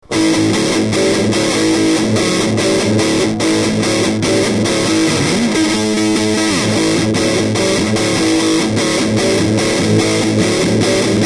boogie

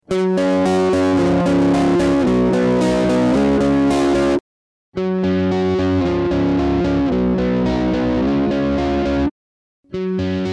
Hot Line Sp Sim soft

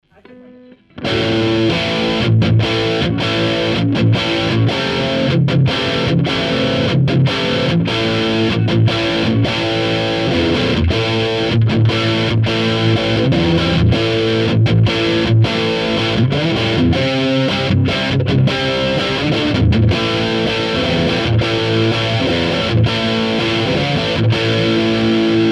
HotBox Lead

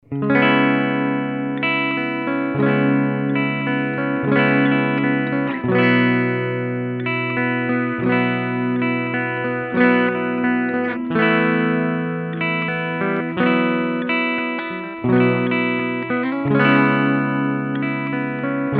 Ross Phaser Clean

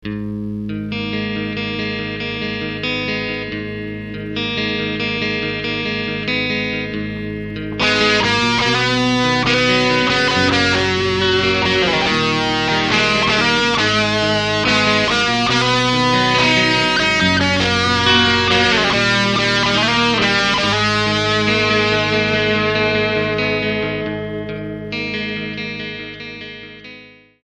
SANS AMP GT2

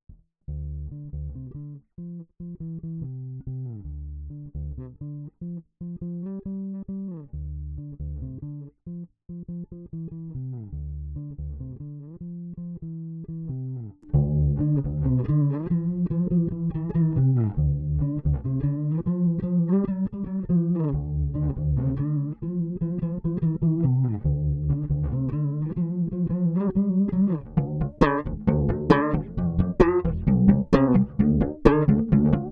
Chorus Bass; Elur K Iv

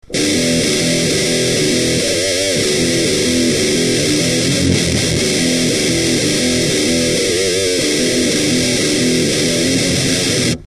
hardcore

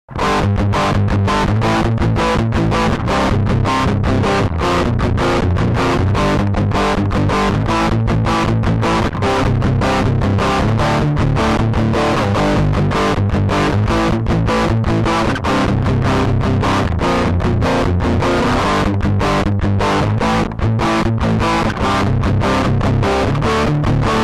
dist riff

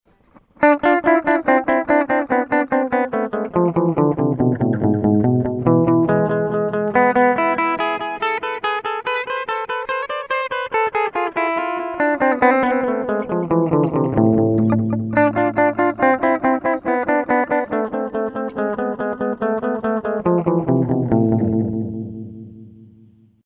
ELUR K-IV Analog Delay

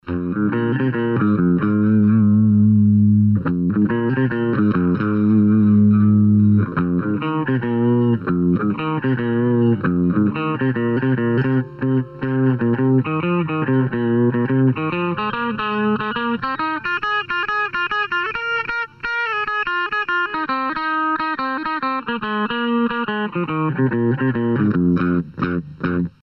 MXR DynaComp Clean

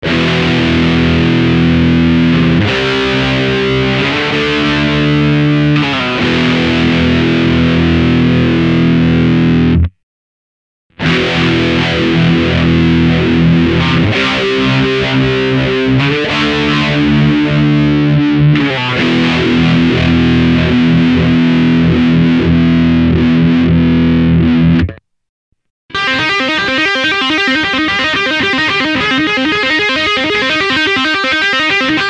Phaser before distortion; Ross Phaser

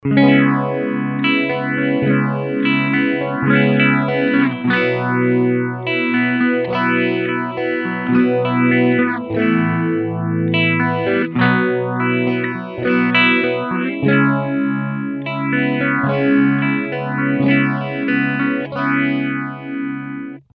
Ross Phaser Clean Rate12 Depth0

